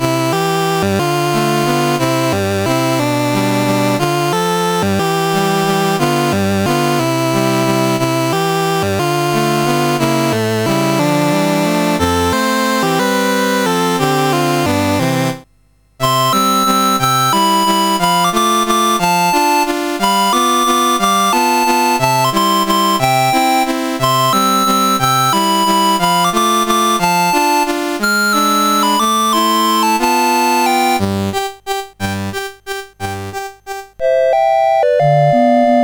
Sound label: Emperor Waltz, CSG8580R5